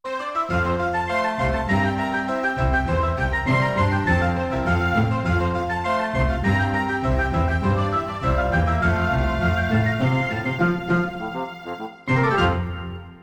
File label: Classical Music; Nancy Drew: Danger by Design